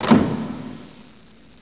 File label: DOOR